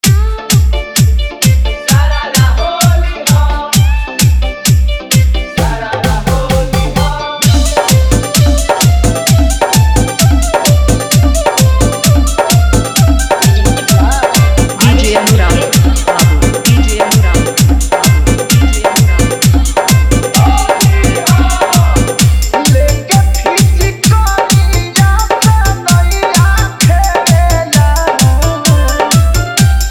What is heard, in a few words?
Jhan Jhan Bass Holi Dance Mix